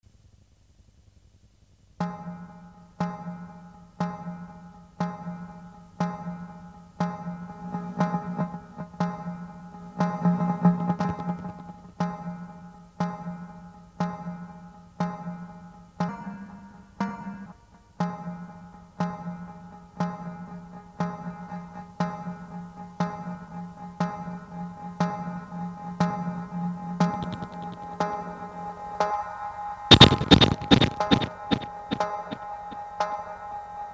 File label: 8bit, Live; Kemerovo